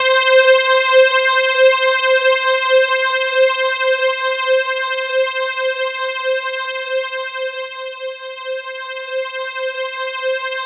C5, Synth Line; 90 s Sample CDs; NiceBeats; SynthLine VOL-2

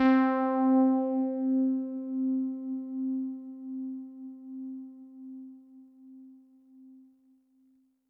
Synth Prophet One Shots, Keys C; Electronisounds

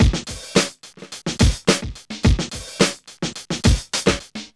STOMPIN 107, Funky Elements loops; Zero-G

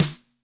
GRCH LO, GRETSCH SNR; ILIO; Double Platinum Drums 1

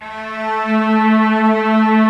COMBO STRINGS; OMI - Universe of Sounds

